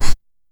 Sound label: bassy raspy quiet